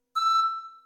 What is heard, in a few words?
Pan Flute; InventorLabs; TECHNOLOGY